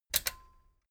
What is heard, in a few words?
metal button stereo click enable heavy